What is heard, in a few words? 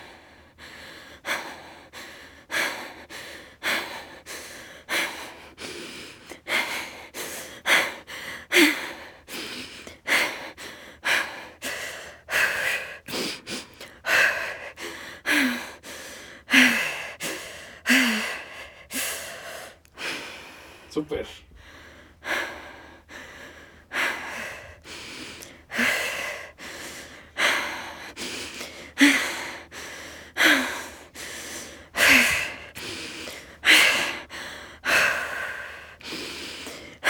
Female Heavy Breathing Angry Anxious Mouth Upclose Closed Open